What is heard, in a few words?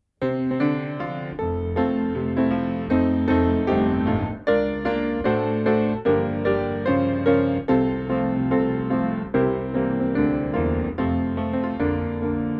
Choir